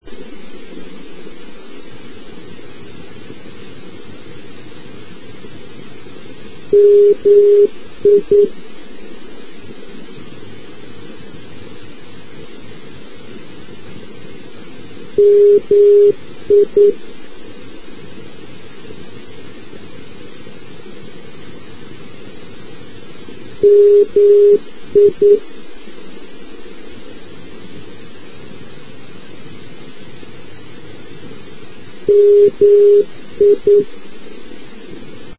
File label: ndb mikkeli; maakeskidx; europe